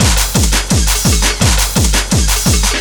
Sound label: LOOP, Eurotech; Sounds Good